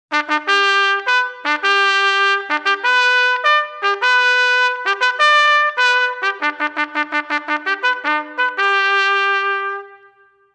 Inspection, drum corps

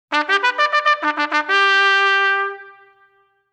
Carry On, drum corps

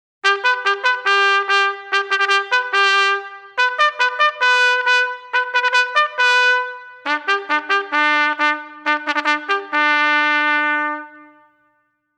Mess Call, drum corps

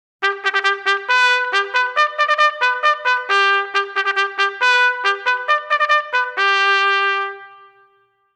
Recall, drum corps

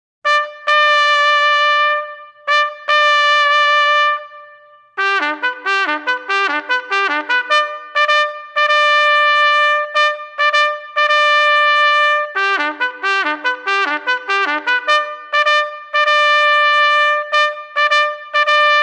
To Arms, drum corps

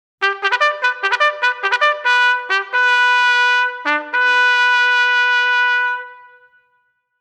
Company Commander's Call, Marines drum corps

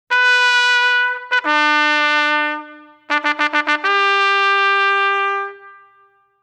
Belay, drum corps

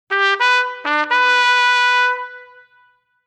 Attention, drum corps

